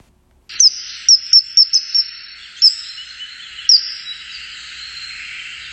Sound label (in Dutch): geluid ijsvogel